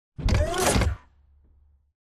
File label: combat run to idle; Titanfall 2